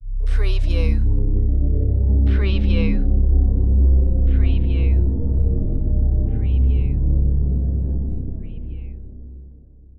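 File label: Deathly Bass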